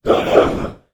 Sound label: Demon; little robot sound factory